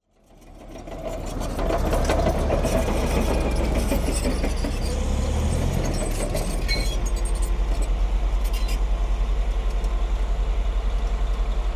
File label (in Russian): obshhij fon stroitelstva